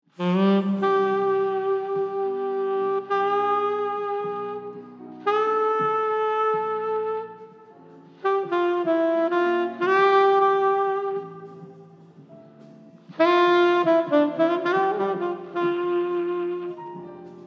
Tenorsax DVR

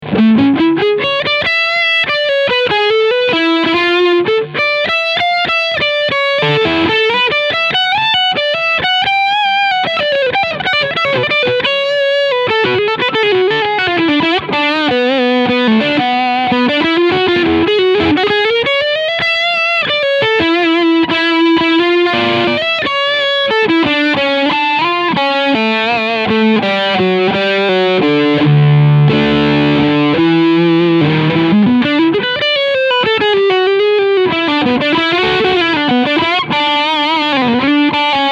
Ibanez AZS2200F Leadsound Steg Pickup

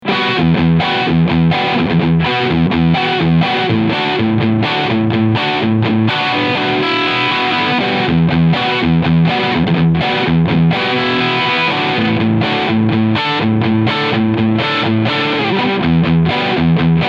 Orange Rocker 15 Terror EQ 12 Uhr Full Gain Riff

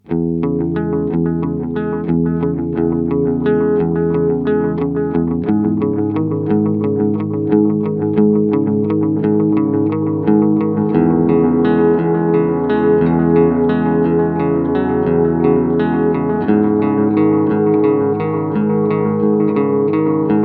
Echosex 3 Tone 12 Uhr; Gurus

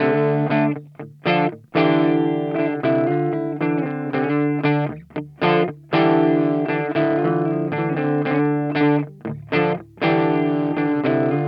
Torpedo CAB M 1 Trocken

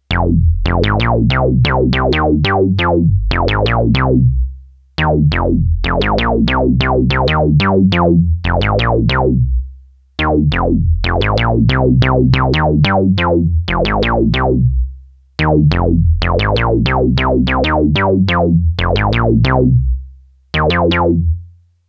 Resonanz Bass D

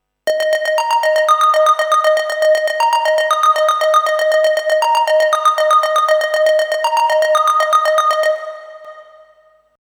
Arp ohne; MDX2600

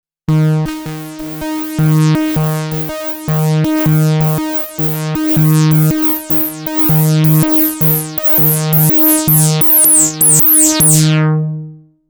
Resonanz FB10 SEM

